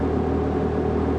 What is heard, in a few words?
idle, vehicles; TDMCarpack; TDMCars